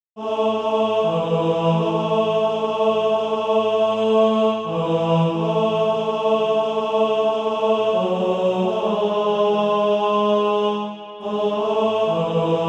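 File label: tenor, Sheet music (SATB) including Practise Music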